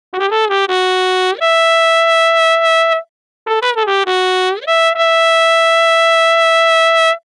flugelhorn vibra; samplemodeling

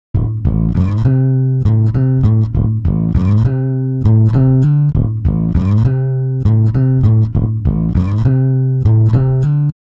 stretch, bass; ik sampletank

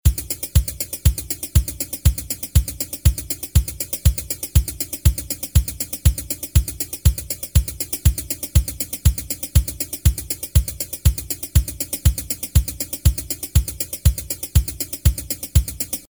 flanger

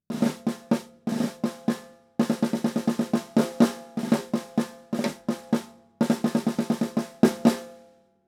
drums stereo; akg 214

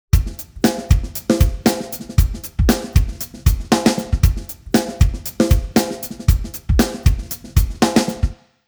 drums, timestretch; izotope radius

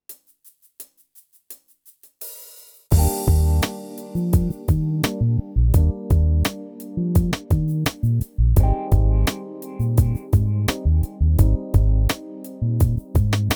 style ballad r and b soul ballad, yamaha tyros3

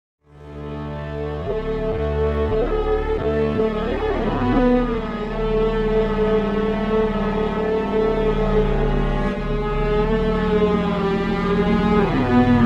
strings; timestretch; ikm sampletank stretch

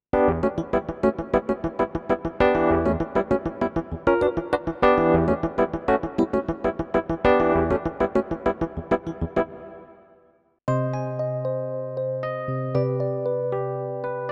rhodes, yamaha tyros3

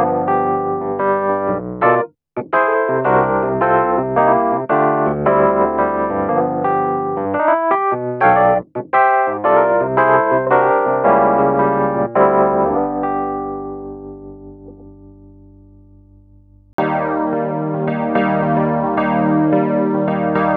kb wurly; kurzweil pc361

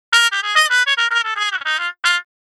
harmon stem mute; samplemodeling

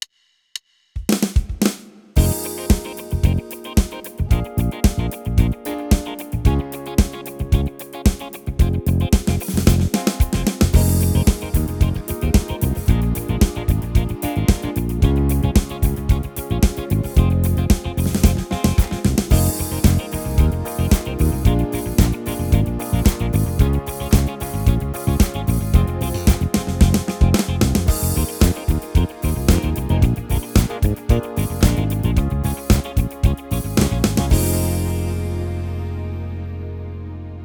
tyros3 style dance 70s disco funk, yamaha

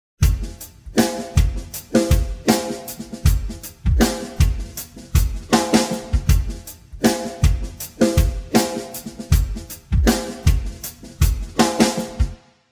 drums; serato pitch n time le; timestretch

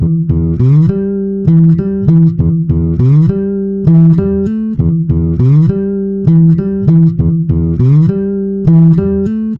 bass; prosoniq time factory clear scale

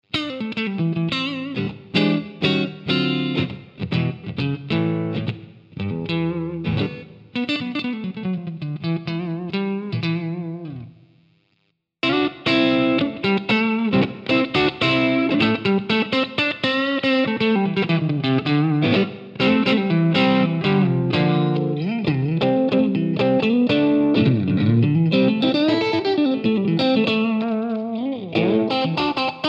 Crunch tone blues strat simulanalog; line6; tonecore; Uber-Metal

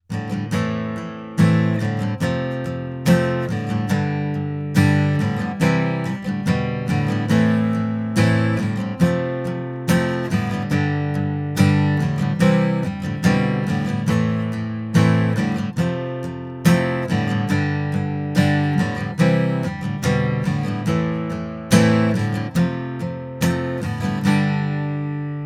rythm; sony; m-audio

